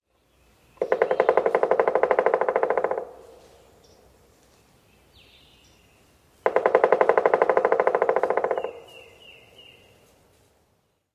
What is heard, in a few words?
drums; NC